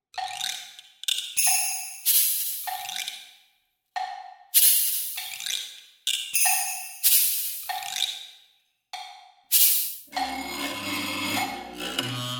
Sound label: glass on tine